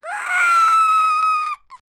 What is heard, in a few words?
death